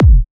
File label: Kicks; Drums